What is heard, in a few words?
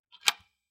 deadbolt unlock; SoundsCrate